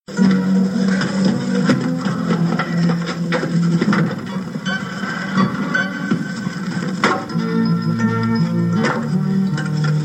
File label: funny theme